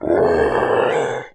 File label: scrm